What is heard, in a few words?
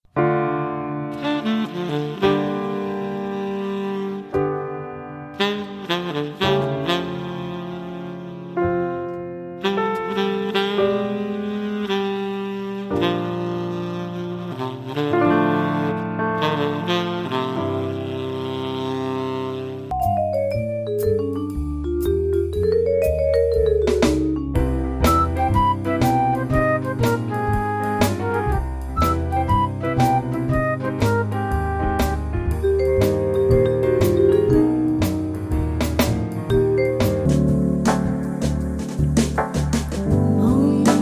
jazz; hudba